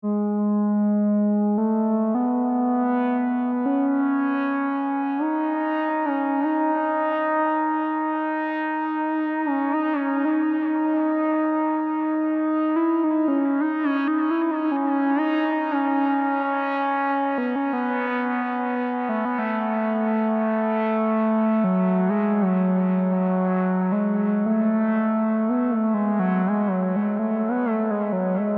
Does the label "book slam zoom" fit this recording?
No